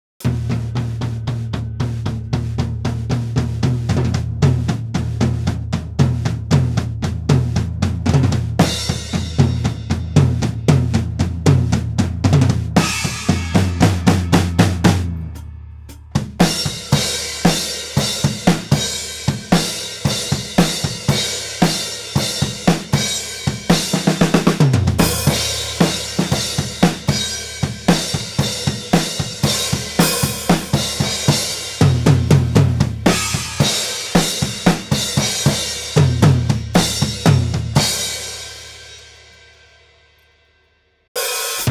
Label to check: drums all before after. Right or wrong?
Right